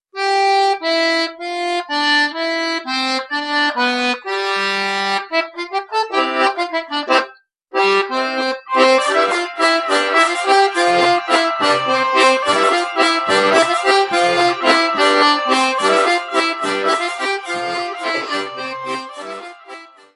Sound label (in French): GRANDE BOURREE FOULARDS france auvergne; Ou irons-nous garder